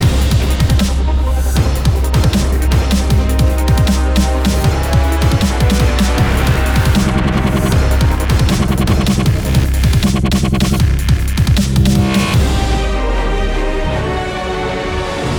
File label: Drill Boss Stage2 156bpm